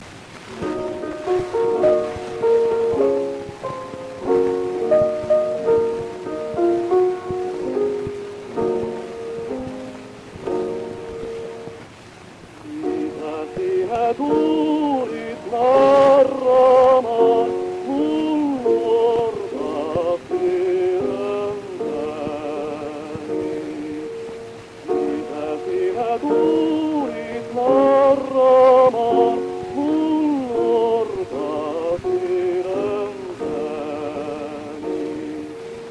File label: Gramophone